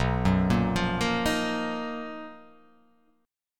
B Major Sharp 11th

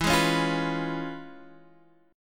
C sharp 7th E; C#7/E; strummed; JGuitar

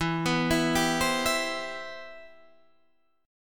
C Major 7th, E, guitar chord

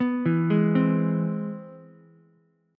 C Augmented Major 7th C down Ukulele; arpeggiated; chord; JGuitar

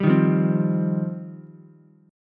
G 7th Suspended 4th Sharp 5th, Ukulele; G7sus4#5 strummed

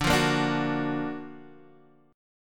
Gb Augmented Major 7th D

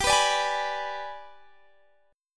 Ab Minor Major 7th Flat 5th, G

